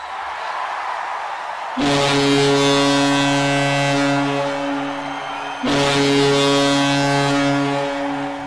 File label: hockey goal